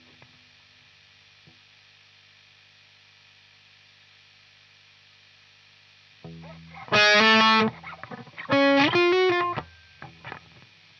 Gtr

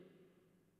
Spring

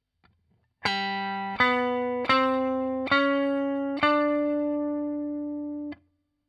Guit Solo, RNR for piano

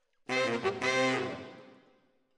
ORTF SCHOEPS, piano

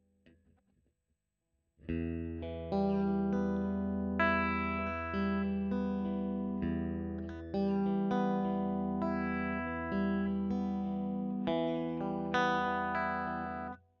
E. GTR Royer

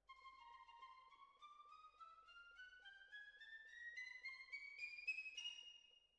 Violas, jazz for piano

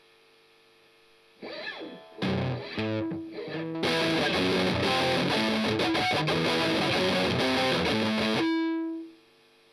Strum Octav

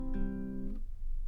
GTR

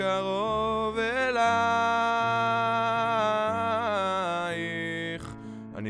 Lead B

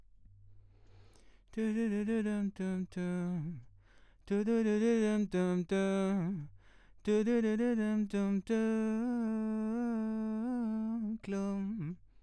VOX

